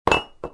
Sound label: crystal drop; movers objects